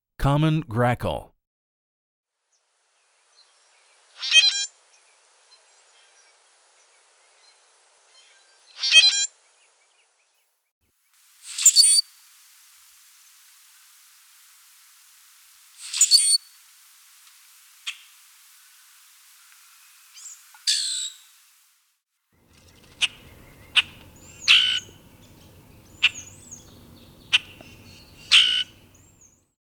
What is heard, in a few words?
Common Grackle